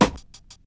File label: old school tight low end hip hop snare drum g sharp key